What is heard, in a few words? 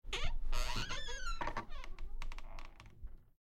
Old Ghost Door